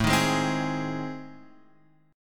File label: A Minor Major 7th Flat 5th, Guitar Open F; strummed; AmM7b5; JGuitar